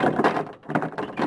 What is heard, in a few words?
door; DAoC